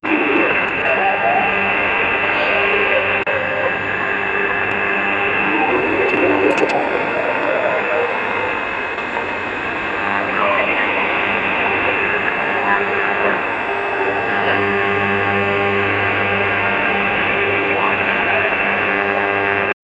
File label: sound of an old 80s